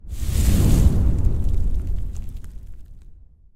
flame whoosh

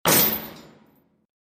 chain hit

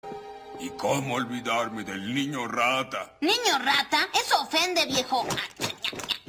rataaaaaaaaaaaa

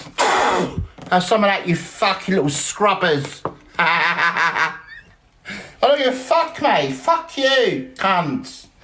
spits; ian moore

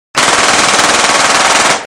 glock 18

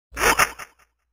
laughs

